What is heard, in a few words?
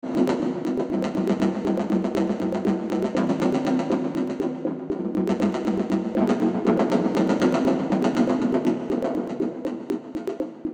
Vplus Drum Resonator; XILS3